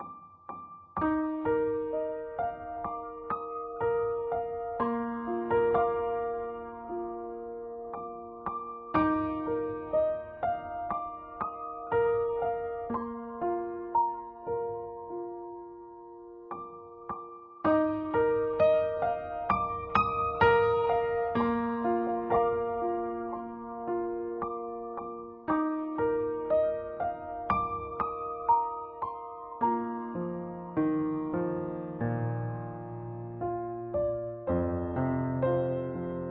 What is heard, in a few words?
BGM Emotional; the letter a horror visual novel switch gamerip 2021